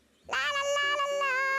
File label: artilalala